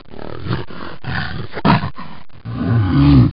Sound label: sound Orc